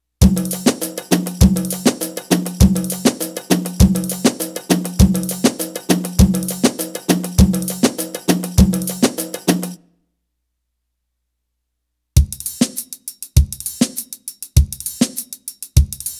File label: Arabic Rhythms